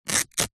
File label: foley clothes running shorts polyester rip